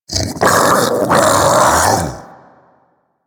Infested Splitter Vocal Alerted; Warframe; Grey Strain

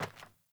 Sandals Walk Metal, Footsteps; Characters; Player

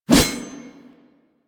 Sword Swipe; Warframe; Weapons; Melee; Whooshes

